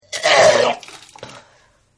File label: Vomit; Vomiting; Human; Partners In Rhyme